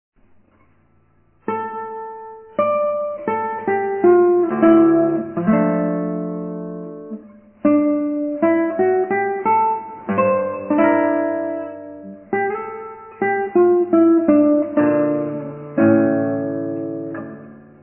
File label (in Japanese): ギター; エンデチャ